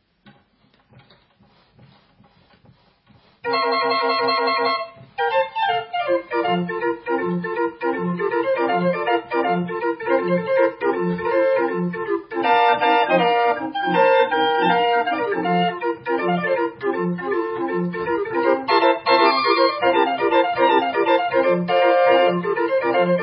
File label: honky tonk train